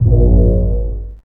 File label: Bass 05C; PCGamer50